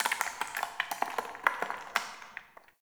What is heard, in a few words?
Cave Ocean Rock Crumble; Midrath Island